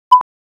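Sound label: peak, Sound sonification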